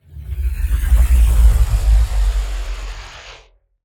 Whoosh

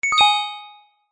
multimedia game sound gem treasure found

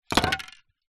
industrial rust spray can knock over surface

